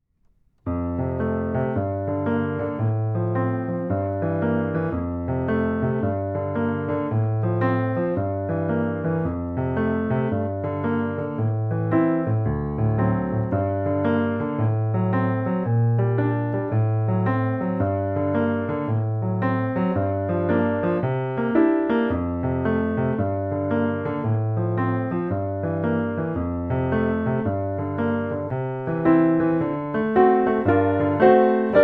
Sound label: Piano